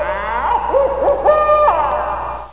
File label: hoot owl